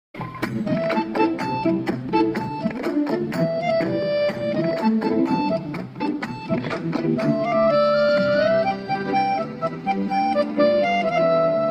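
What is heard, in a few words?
telugu karaoke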